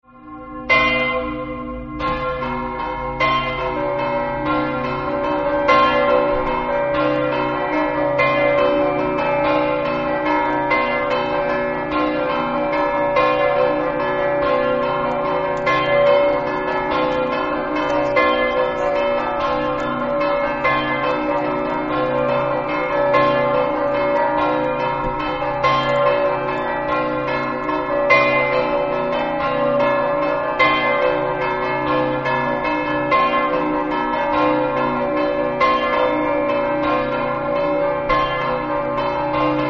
rivignano, campane

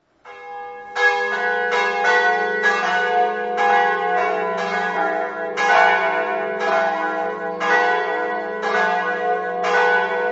prepotto, campane

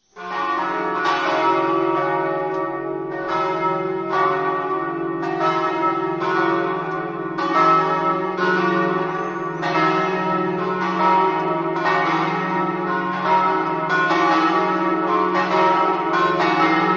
moggio, campane

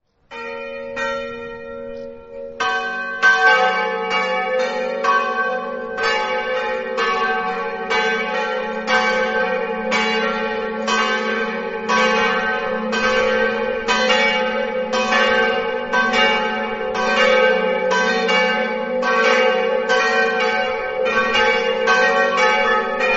caporiacco, campane

